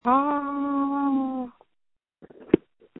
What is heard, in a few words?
Scream